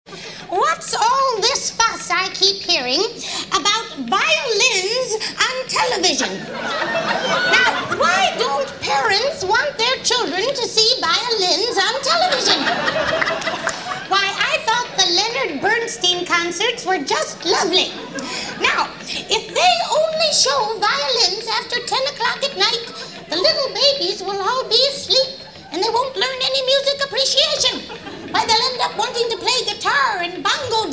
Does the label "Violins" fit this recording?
Yes